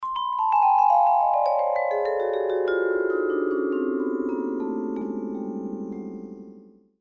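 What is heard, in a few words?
Vibraphone Slow Down; mallets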